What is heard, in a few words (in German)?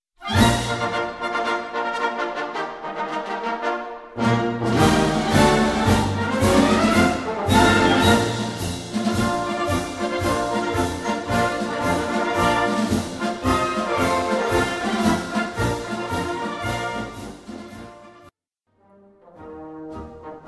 Marsch; Marsch Der Reservisten